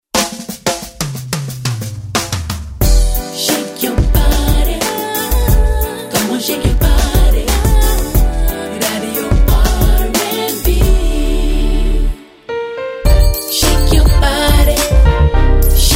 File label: radio r&b